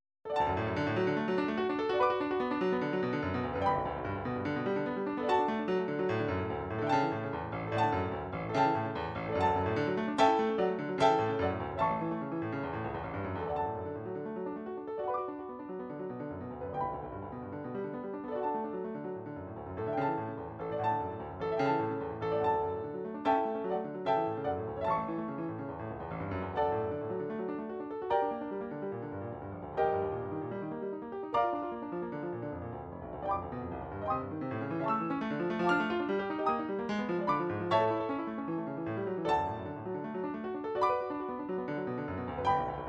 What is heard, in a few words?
studies for piano, midi